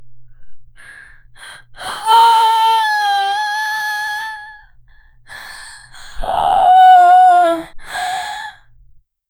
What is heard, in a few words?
a low pitched guttural fe, vocalizations